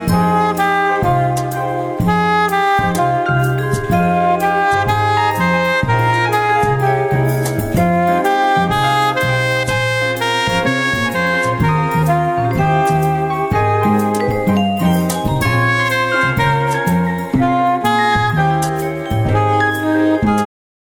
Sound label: alto; Простых мелодий для саксофониста